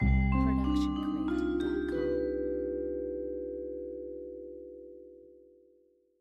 Mystery Ident; soundscrate

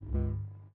lightsword swing swipes; soundscrate

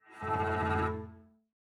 Cello Shivering Tremolo; SoundsCrate

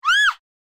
woman fear scream; soundscrate

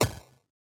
8-Bit Gun Shot; SoundsCrate

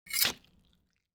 bloody knife stab

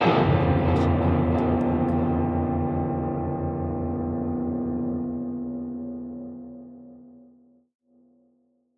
horror haunting piano hit; soundscrate

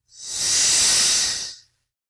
snake hiss

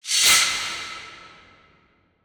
designer high scrape hit; SoundsCrate

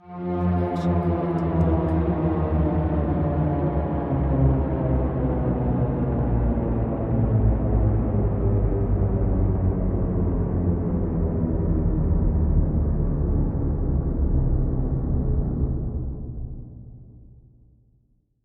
intense string fall; soundscrate

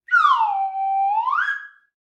Slide Whistle Down and Up; SoundsCrate

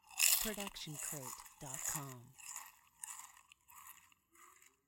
chip crunch chewing open; soundscrate

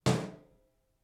Metal Footfall; soundscrate

